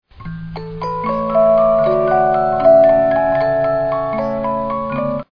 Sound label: Jesus Joy of Mans Desiring, 50-Note Movements